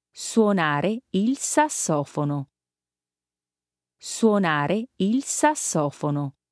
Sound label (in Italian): suonare sassofono